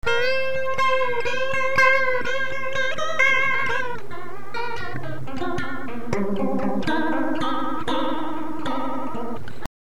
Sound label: vibrato